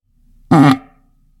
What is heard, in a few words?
farts